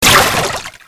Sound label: water